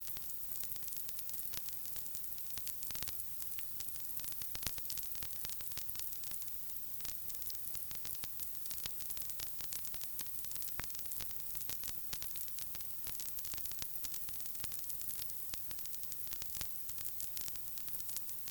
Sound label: Vinyl Noise